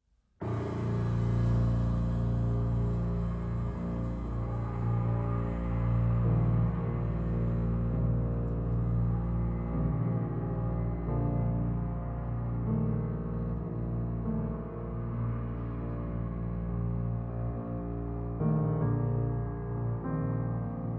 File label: Gospel